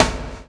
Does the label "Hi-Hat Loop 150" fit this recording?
No